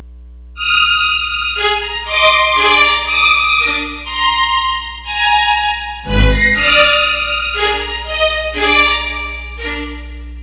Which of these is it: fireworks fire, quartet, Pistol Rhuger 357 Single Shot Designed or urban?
quartet